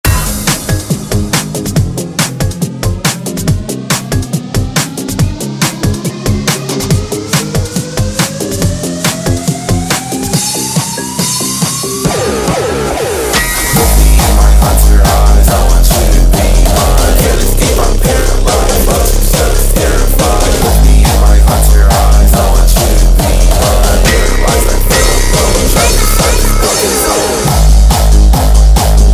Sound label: hardstyle